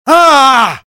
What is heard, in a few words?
spy pain severe; svencoop addon; tfc; player